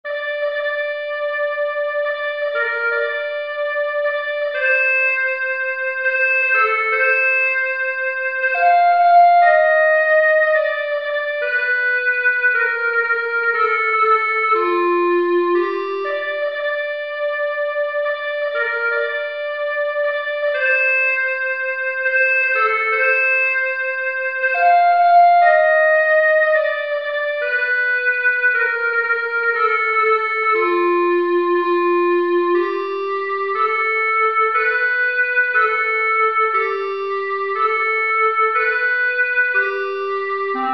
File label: clarinet